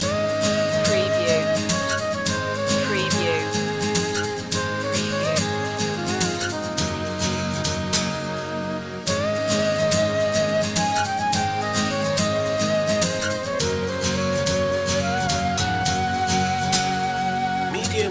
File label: guitar slinger loop